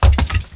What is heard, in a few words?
thud